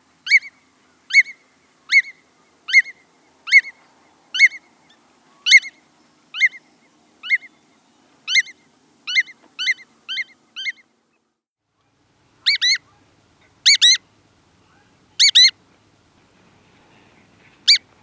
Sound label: Hudsonian Godwit